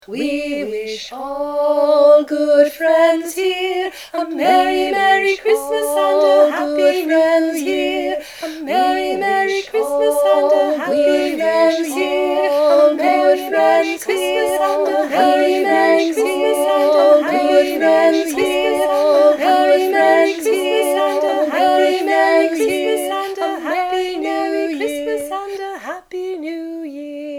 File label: Merry Christmas Round